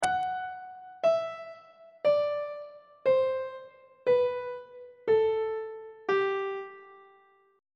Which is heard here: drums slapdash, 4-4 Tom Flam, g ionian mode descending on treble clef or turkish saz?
g ionian mode descending on treble clef